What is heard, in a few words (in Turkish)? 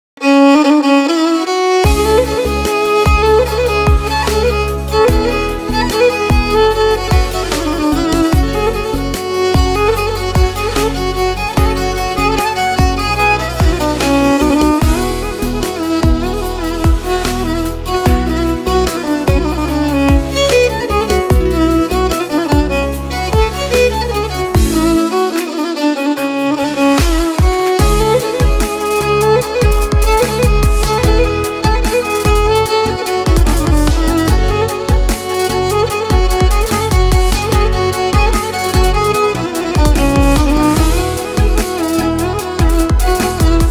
kemence cover